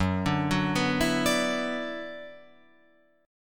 Gb-7th Suspended 4th Sharp 5th-Gb-6,9,6,6,7,9-8-down-Guitar-Open F; arpeggiated; JGuitar